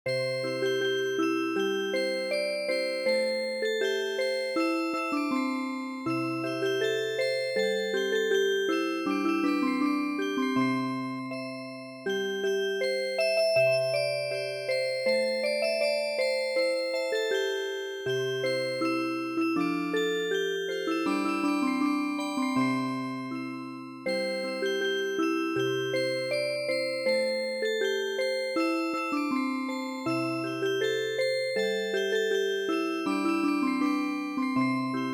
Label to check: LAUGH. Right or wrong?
Wrong